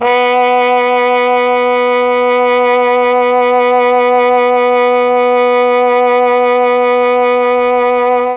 B2 TROMBONE, Mellotron; CHMB TRMBONE; Mike Pinder - Mellotron Archive CD

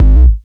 sub, sub bass; Techno ID; subbassdrums

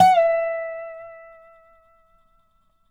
dobro; DOBRO DOWN; Country

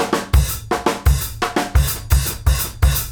KICK SNAR, Live Snares; PlatinumPhattCD1; E-MU Producer Series Vol. 8 – Platinum Phatt

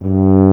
BRS TUBA, Solo Instruments

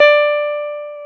Jazz Guitar; Club-50 - Foundations; Jaz-Kessel

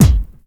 KICK, DRUMS; USB Soundscan vol.02; Underground Hip Hop; AKAI

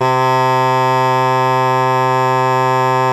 BASS SHAWM; SoundBytes - Early Patches

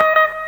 GTR RIFF L, Funky GtrLicks; E-MU Formula 4000 Series Vol. 1; Hip Hop Nation

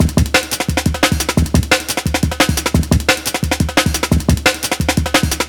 175, classic dnb breaks

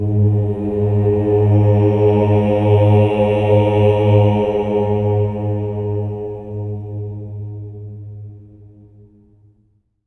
OH G#2, SWELL OHS, Voices; Spectrasonics; Symphony of Voices

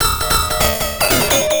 Atonaloop, Industrial Analog

